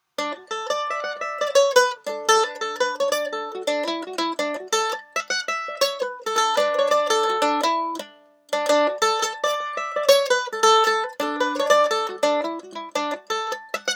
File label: Mandolin Pick Comparison